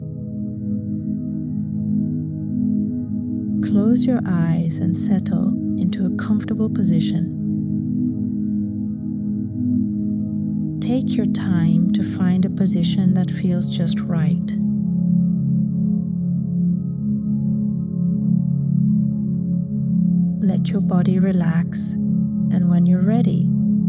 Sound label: Breathing Bubble Renewal Music